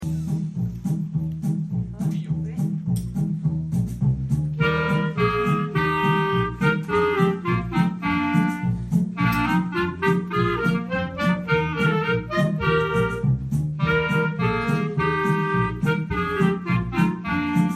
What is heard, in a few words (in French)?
Clarinettes